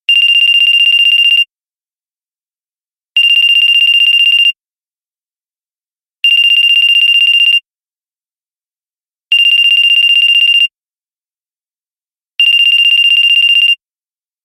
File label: Ring From An Anime